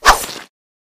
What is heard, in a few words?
slash; evil bambi